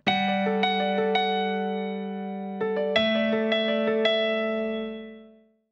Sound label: Piano